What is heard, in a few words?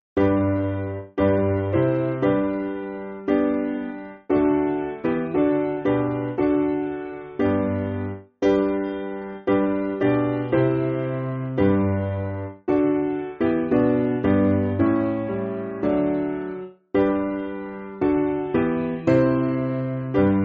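Piano, Free Music Backing Files for Churches; As The Disciples; Diva Servatrix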